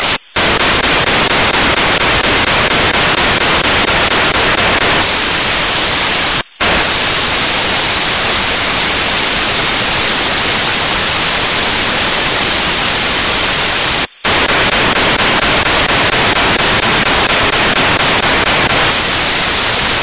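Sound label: 437421kHz, satellite; AAUSat-3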